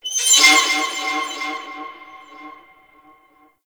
High Metal Screech; Props; Warframe; Tau; Ambience

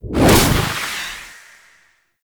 Ember Fire Ball; Warframe

